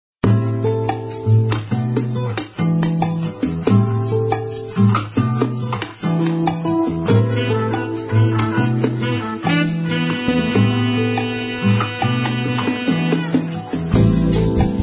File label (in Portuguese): Bossa Musica